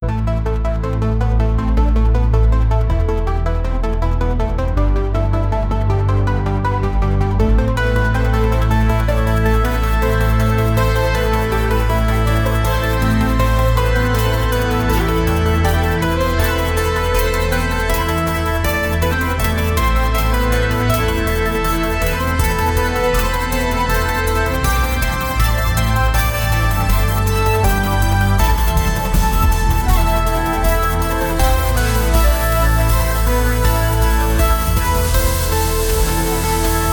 intro, introduction

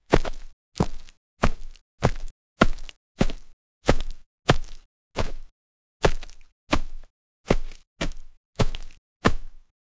foot steps sound; fleshy

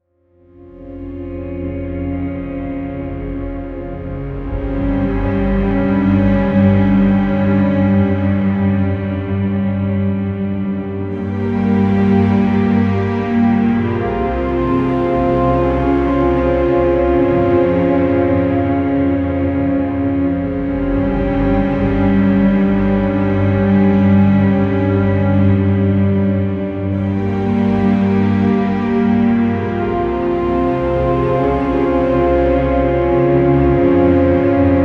dark stealthy cinematic, loopable

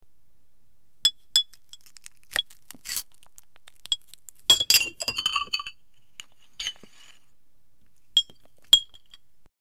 an ice cold beer bottle, beverage

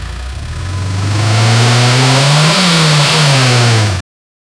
3 cylinder engine

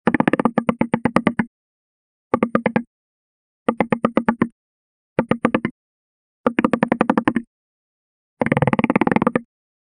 playful, board game

